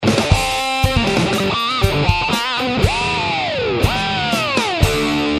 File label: Heavy TBSCR JCM900